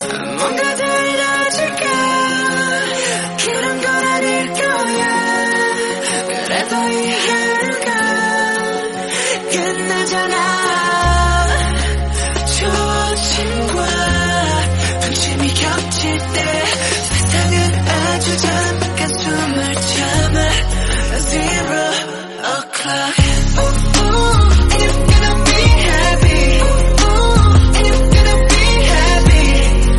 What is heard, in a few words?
Korean Song